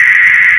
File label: pain; openffa; bunbun